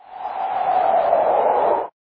magic wind

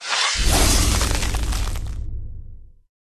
bug die

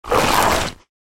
Worm

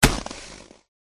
snowman attack contact up

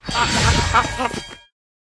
Monkey; Pet; monkey balagur

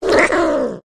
cat wound

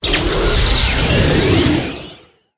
werewolf boss buff

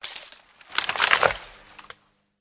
fur tree death

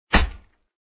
sheep attack contact up

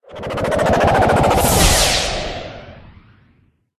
snowman buff

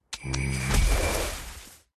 Deer die; Pet

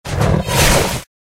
Tiger

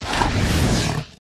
White Bear Dodge

